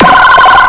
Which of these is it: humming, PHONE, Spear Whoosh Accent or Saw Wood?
PHONE